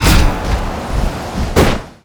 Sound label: cast